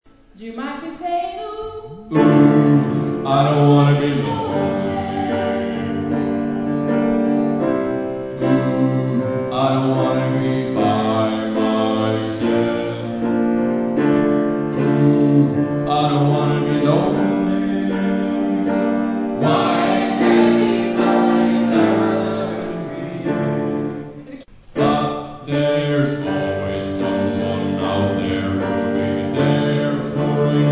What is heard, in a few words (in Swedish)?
bas